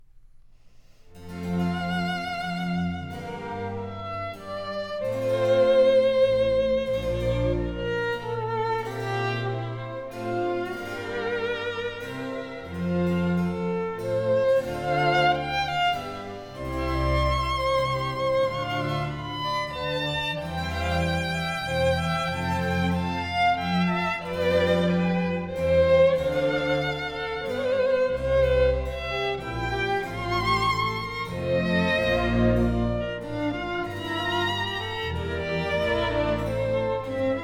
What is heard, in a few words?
Concerto for 2 Violins, Strings